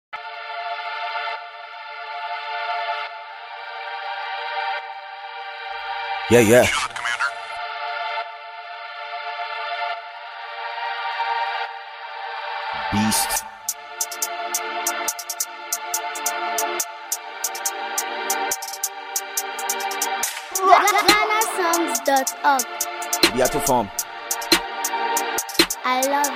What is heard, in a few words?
Diss